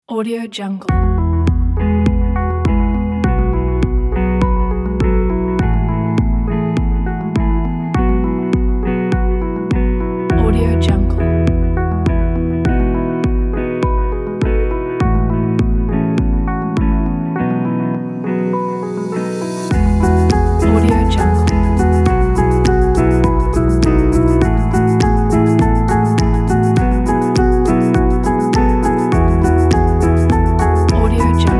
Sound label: guitar ambient